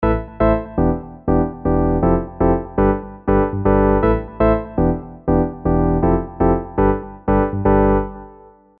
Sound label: EZkeys 2 Electronic Pop House Arp Friendly